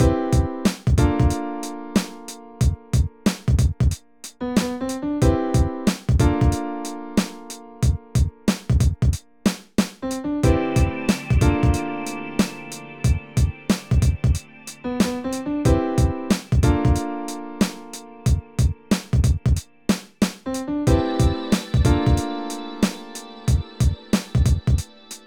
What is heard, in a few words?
Hip Hop Piano groove; groove, hip hop, piano, samples, electronic, jazz